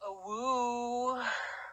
AWOOO

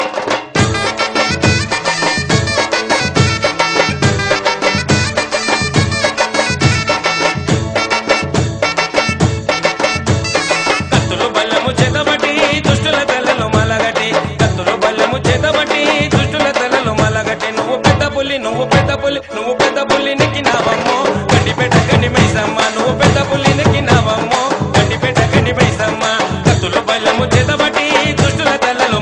Telangana Folk

